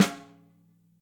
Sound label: Snare Softest